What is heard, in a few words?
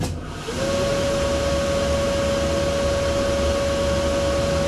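ventilator, industry; AKAI S-Series CD-ROM Sound Library VOL-6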